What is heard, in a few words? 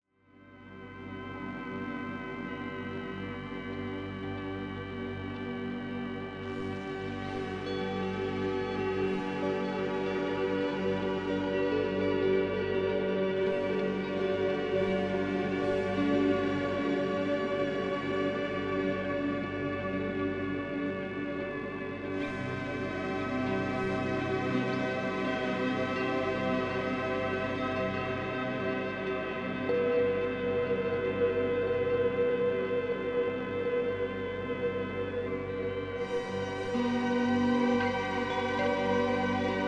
Drumor